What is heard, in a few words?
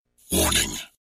Warning